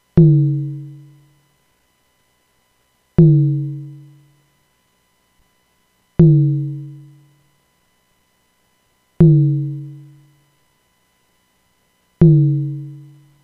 nonlinear drum

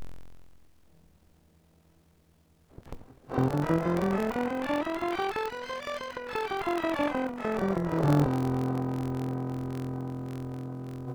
C scale distort

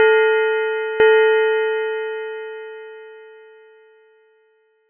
bell

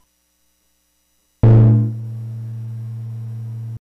higher pitch

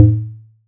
bass drum

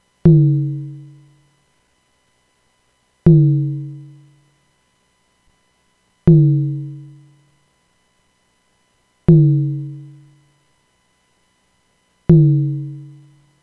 nonlinear drum

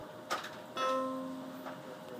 electrical piano

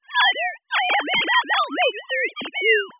sine

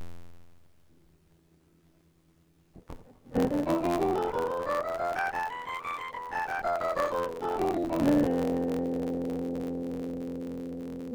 C scale 2 shift

